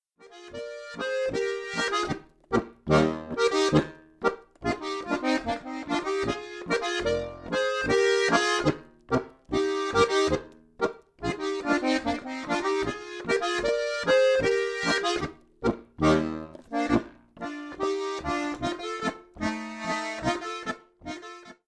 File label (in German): Boarischer, Volksmusik; Griffschrift